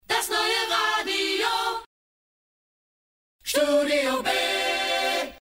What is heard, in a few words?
Neu Acapella; DRS 3; studiob